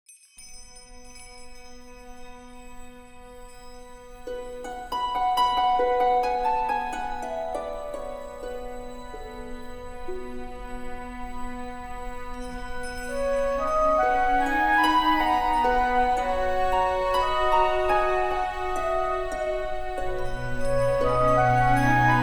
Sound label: Maintheme Orchestral; TibiaME